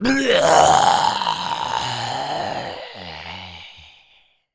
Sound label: Death, Zombies; zps; Carrier